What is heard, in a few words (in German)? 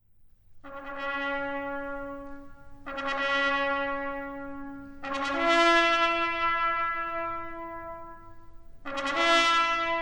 Sinfonie Nr. 5 cis-Moll, Beginn; G. Mahler